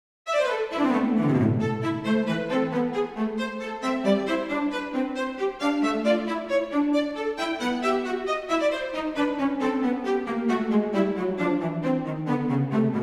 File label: Sonata in A minor; Scarlatti; hold music